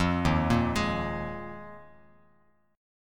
D sharp Suspended 2nd Suspended 4th D sharp Bass Standard; arpeggiated; JGuitar